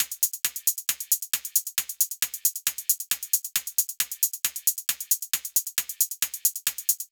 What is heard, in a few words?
135 House Hi Hat 16Th; Dance DJ; Drums; Hats; Pro Tools Essential Loops; Digidesign